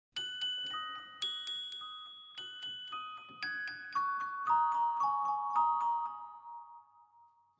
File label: Clavichord Chinese M; keyboards